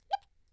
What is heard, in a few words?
blip